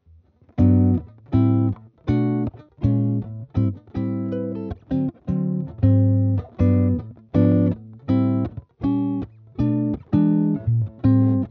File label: VOX Fender Jazz Chords